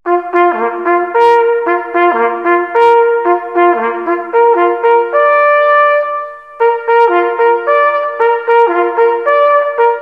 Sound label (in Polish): Pioro na rozkladzie